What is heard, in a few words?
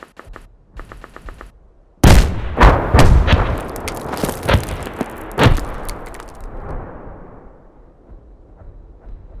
Massive Explosion Short Distance Long Distance